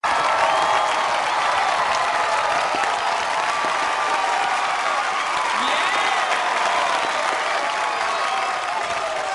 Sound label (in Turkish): Alkis Sesi